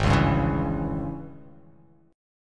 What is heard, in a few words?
G sharp Major 7th Suspended 4th Sharp 5th